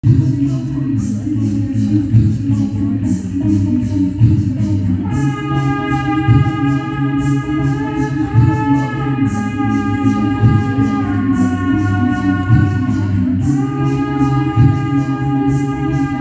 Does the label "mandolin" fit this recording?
No